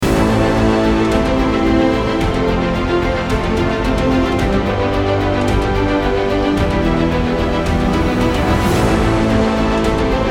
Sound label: action trailer loop